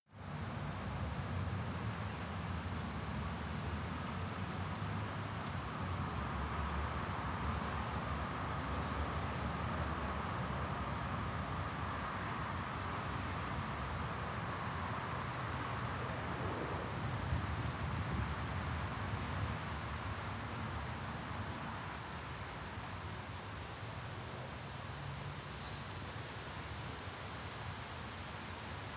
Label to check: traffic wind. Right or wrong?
Right